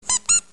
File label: Squeaky Toy